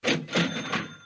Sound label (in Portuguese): caixa registradora